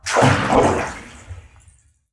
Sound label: indoor water splash reverb; soundscrate